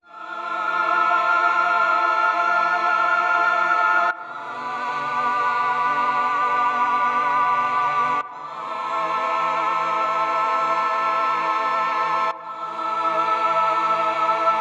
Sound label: Looking Back Groove